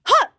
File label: Cloth Combat Jump